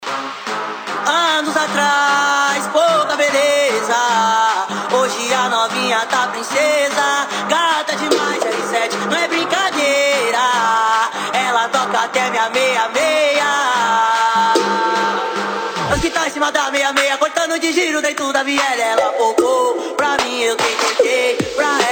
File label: trap funk